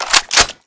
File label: M3 bolt pull, greasegun; svencoop; hunger; weapons